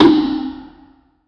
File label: aggro roar of a heavy, lava